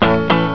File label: two chords